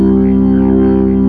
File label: B3 C1, ORGAN